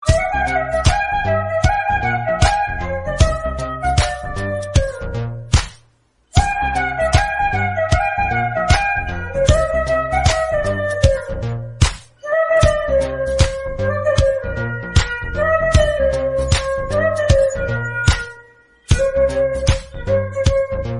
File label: Instrumental Ringtone